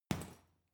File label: boxing punching bag hit soft; boxen